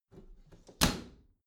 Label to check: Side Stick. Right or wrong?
Wrong